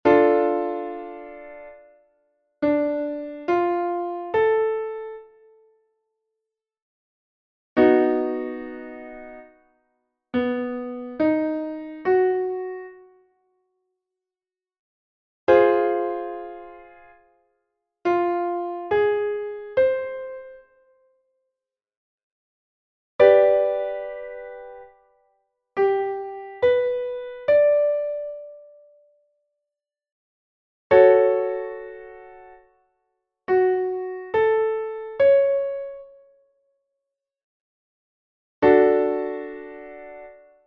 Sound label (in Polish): trojdzwieki; lekcja 08; klasa 2